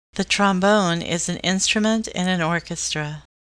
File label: trombone